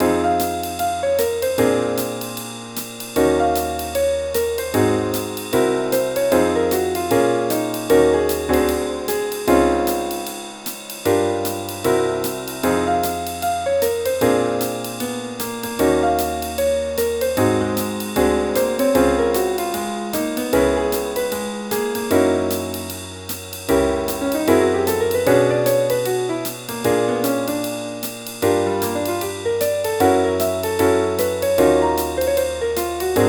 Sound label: envelope midi